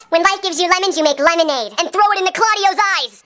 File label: notification; lemon